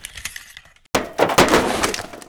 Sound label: Inf Weapons Foley; Red Orchestra 41-45